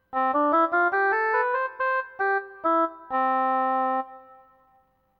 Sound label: oboe short